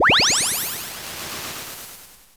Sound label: Build; Graz